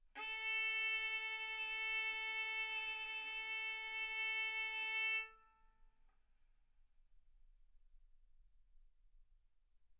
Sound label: Violin arco mf sul A A4 B4 stereo